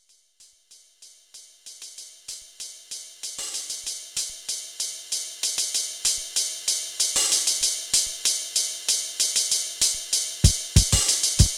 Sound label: Wersi CX2 dry Shuffle